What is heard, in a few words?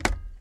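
button click sharp ringing close double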